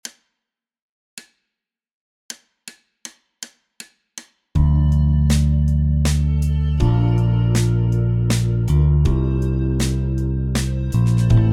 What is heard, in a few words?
bpm80, backingtracks